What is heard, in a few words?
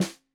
Snare 65 Reg Stereo, Deeply Sampled Drums